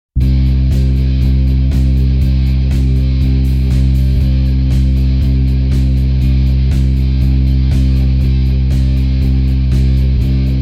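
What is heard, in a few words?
Bass Electric Quarter Root Even 8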